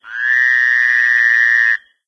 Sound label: small frog, frog groups; ambient; random animals; Left 4 Dead 2; Source Engine